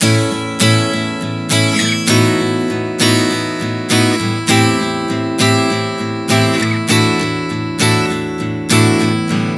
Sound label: Progression 100 A-B-C-D, Acoustic Guitar, Chord Progressions 100 bpm